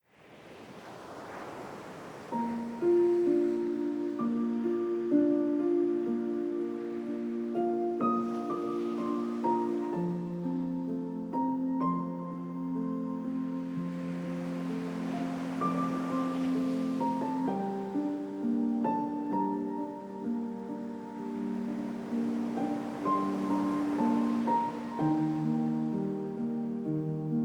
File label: piano sea slumber